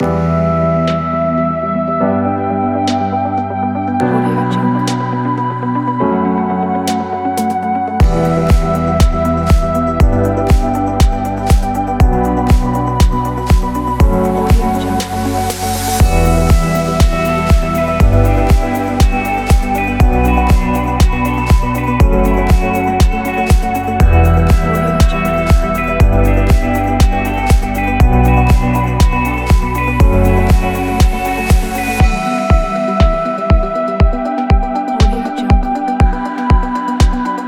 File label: luxury style